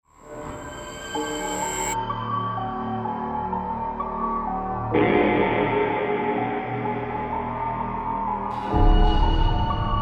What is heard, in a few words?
horror; reimagined